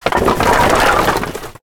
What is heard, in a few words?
objects, World of Tanks